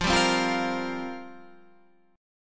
F Suspended 2nd Suspended 4th F down Guitar Half Step Down